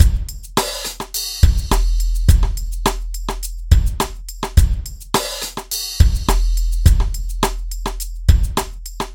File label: Heavy Hip Hop 105